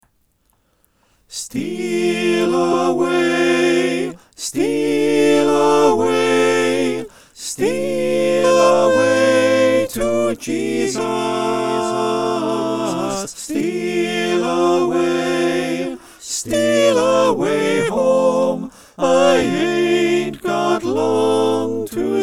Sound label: SATB; Steal Away